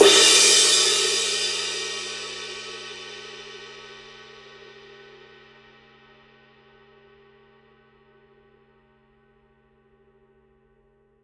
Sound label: crash edge